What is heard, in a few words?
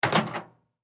Microwave Door, Microwave Door Open; Household Microwave Door Open Appliance; Microwave Doors